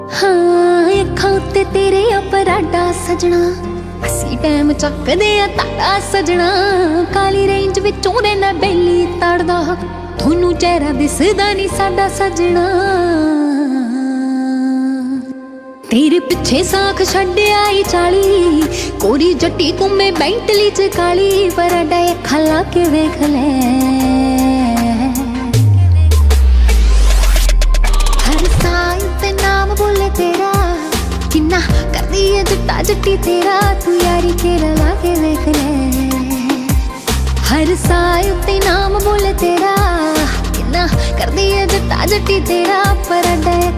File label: Female Version Ringtone